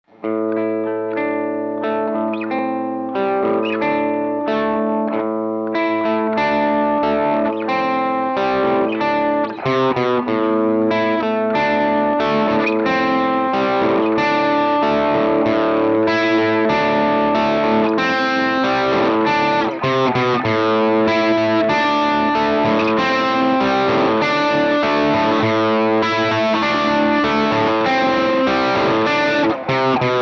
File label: plexi axe